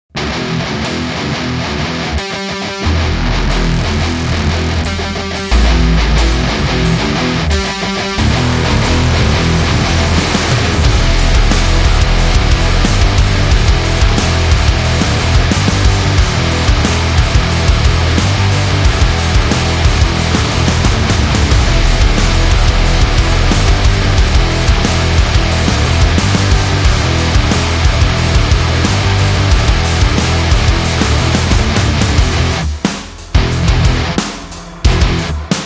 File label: Rock Extreme, metal